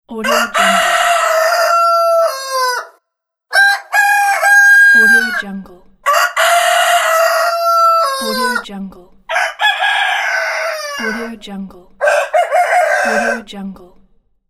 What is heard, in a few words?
rooster crowing